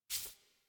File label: UI Theme Tooltip Section; Zephyr; Warframe